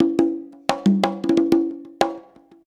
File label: 90 CONGA, PERC